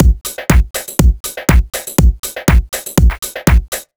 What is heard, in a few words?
121 Drums; Combi Loops; Jack In Da Boxx; Zero-G; Soundclash Nu Dance Electro; Acidized Wavs